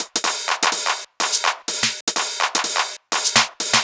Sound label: drum loop clapper top 125, Classic Chicago House; Sample Magic; Drum Loops